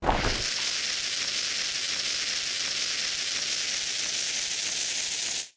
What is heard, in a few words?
Bomb Fuse Full